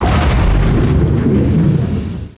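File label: EXPLODE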